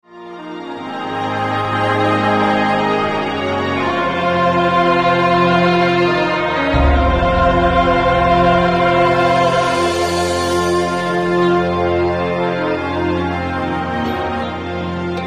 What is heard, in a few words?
oriental harbour; oriental / asian / african